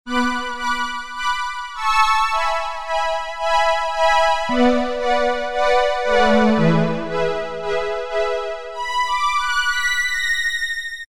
High Strings, Roland Juno-106; Factory Preset

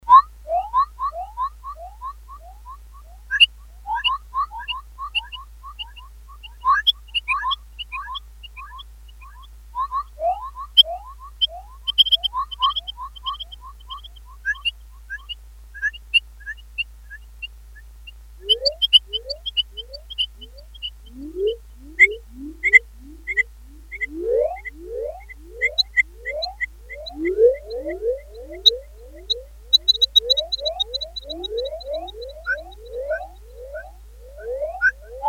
Birds, Korg DS-8; Factory Patches

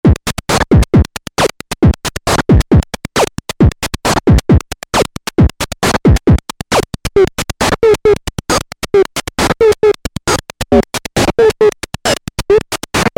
beats

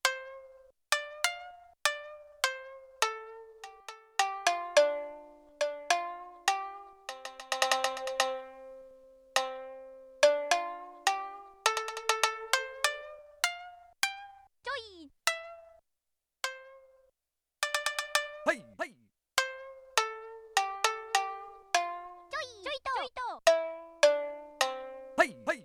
Shamisen; Yamaha TX16W

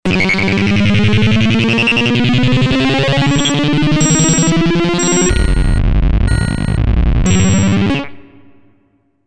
Full Charge, synth

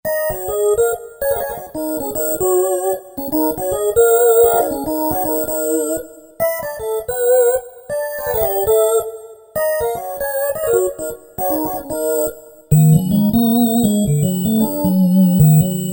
SILKY LEAD, Roland SC-880; Factory Patches

